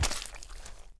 Animal Foot Large Mud; Civilization4

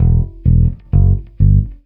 Simple rock, Bass; ACID MUSIC

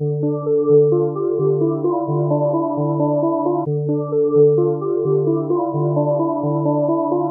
MELODY; Chillout; Future Music

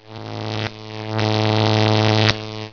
ELECTRIC; Death from Above

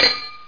GLASS

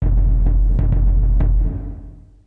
perc

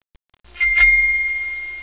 DOORBELL; Talking E-Mail

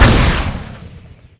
Laser Gun Fire; Defiance

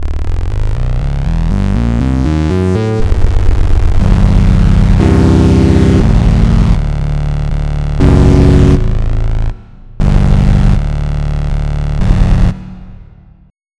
Fuzz Subby; Onj's Soundfont

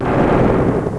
Thunder; FWB Stack

